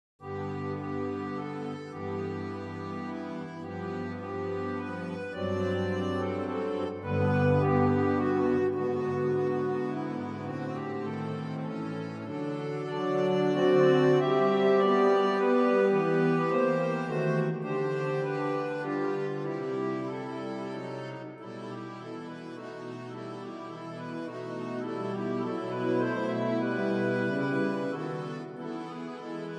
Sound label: Allegretto G, harmonium; L Organiste; Franck